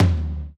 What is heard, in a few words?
TOM